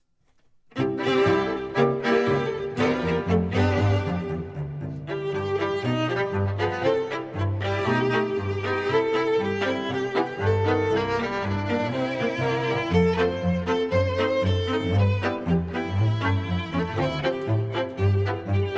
cabaret

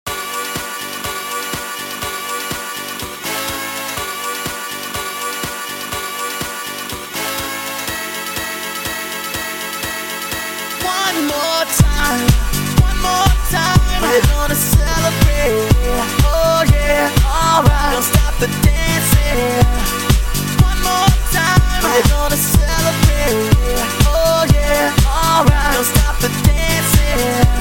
orchestra; classical musicians

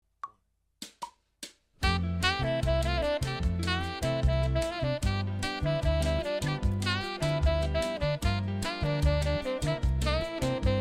latino

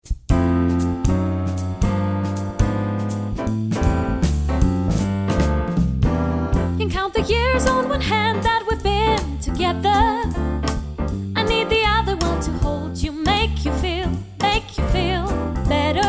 jazz

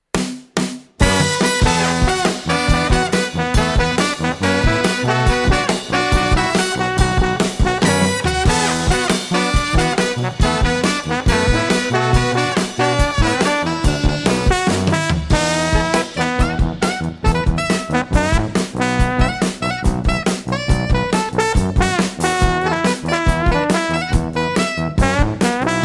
brass

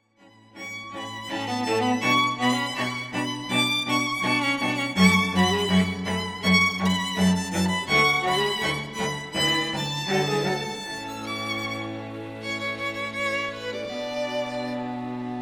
strings

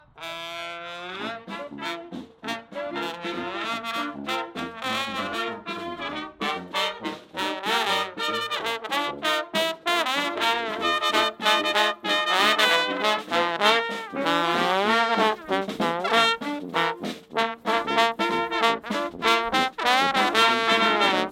roaming jazz

